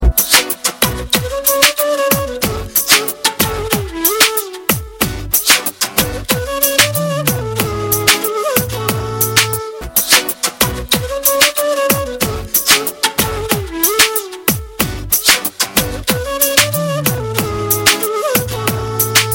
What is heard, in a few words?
Flute Mix; Ringtones